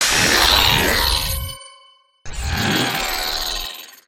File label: Hologram Fire; Venture; ambience nexus tower